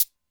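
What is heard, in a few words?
Fast Cabasa, drums, Alesis D4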